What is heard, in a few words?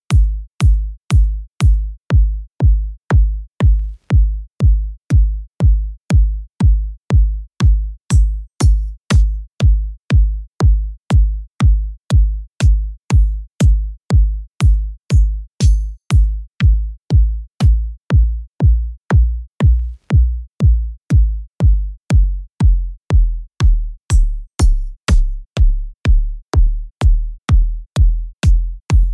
bd builder